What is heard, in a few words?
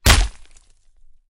limb blunt; Barotrauma; Subsurface